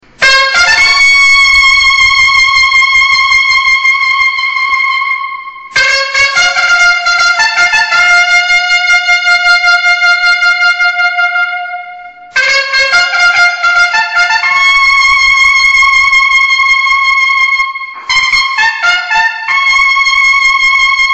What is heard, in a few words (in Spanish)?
silencio floreado legionario